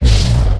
hurt

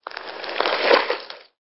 slide

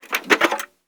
door lock

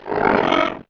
HURT; mahlendur

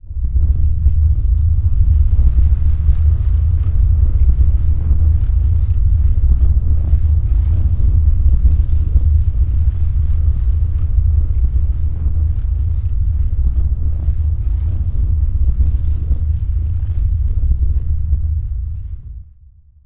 prayer earthquake

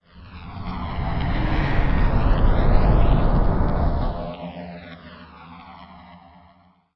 TRANSFORM; mahlendur

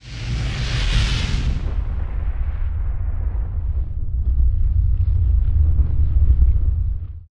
FIRE RAIN

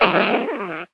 GOB WARN

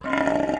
HURT

